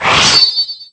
Sword Draw; Ganondorf drawing his sword, grunting, then sheathing the sword (down taunt)

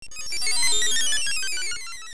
Great Fairy Vanish; Great Fairy vanishing

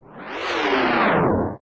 Shake; Morpha

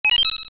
Shell; Getting a Mysterious Shell

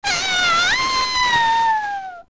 Twinrova Comb Die; OOT

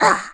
Die; Link dying (2 parts)

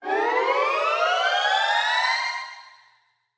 Fairy; A fairy healing Link (Recorded)

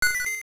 Beehive Poke; Tarin poking the beehive

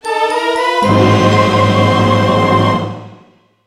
Fanfare Item; Get an item

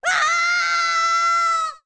Fall; Link's screams while falling

